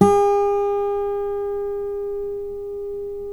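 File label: GUILD 12-string, Guitars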